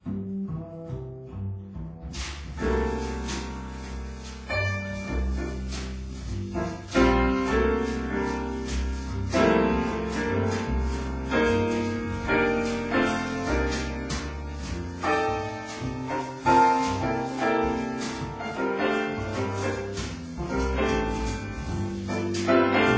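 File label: Music Ambient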